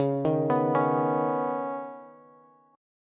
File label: Db 7th Suspended 2nd, Bass, Db7sus2 chord